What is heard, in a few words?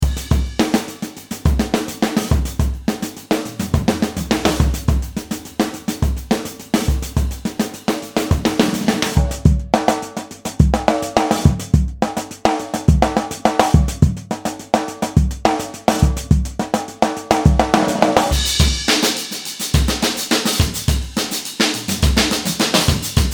drums mix; PPHDRUMREF